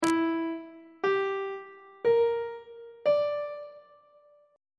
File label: e sharp ionian 7th chord 7 is d double sharp half diminished 7th on treble clef